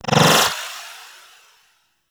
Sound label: Cast; Warframe; Mag